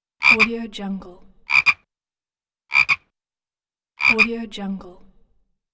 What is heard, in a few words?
frog croak